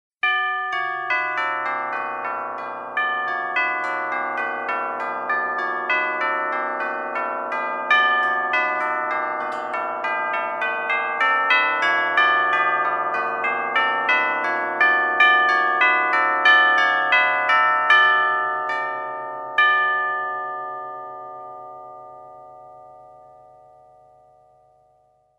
Bell Chimes

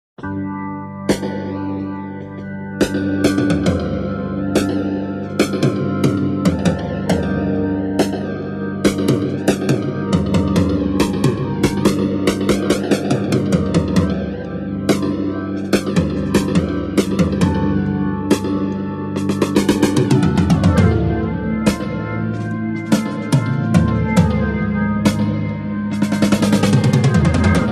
Phasing Drums

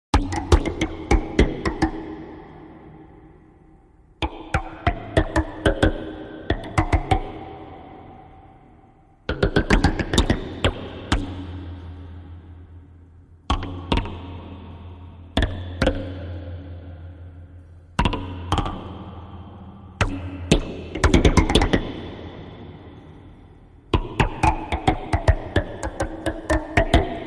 Phasing Percussions

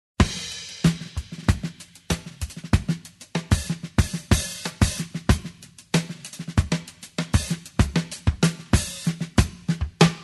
Percussion Power

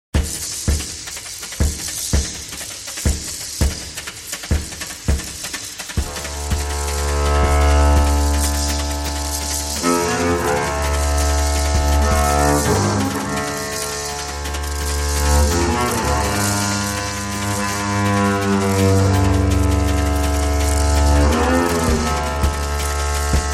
Percussion Bass

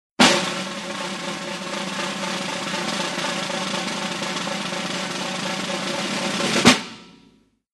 Snare Drum Roll

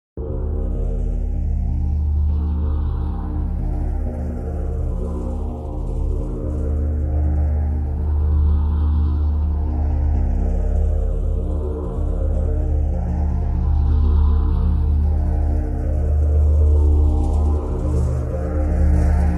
Phasing Percussions

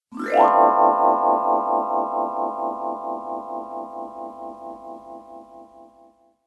Vibraphone

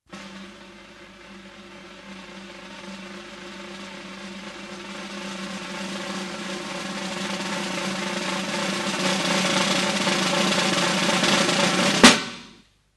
Snare Drum Roll

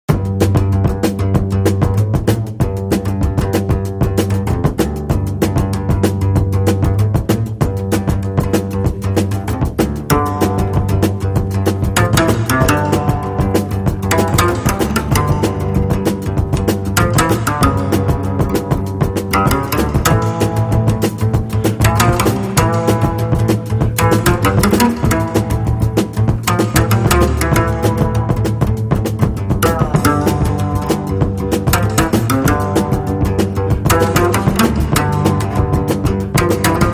Indian Pop Bass

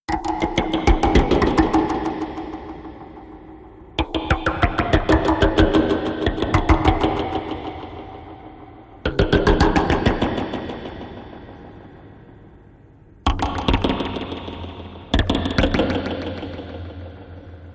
Phasing Percussions

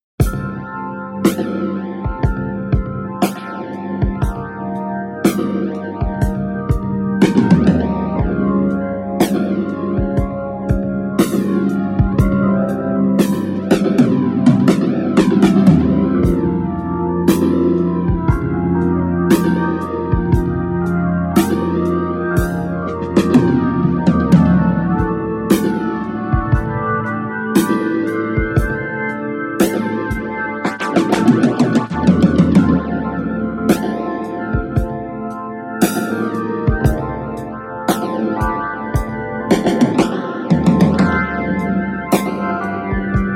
Phasing Drums

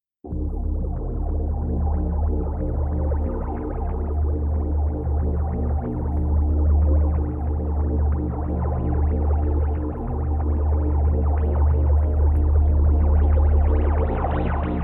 Phasing Cymbals

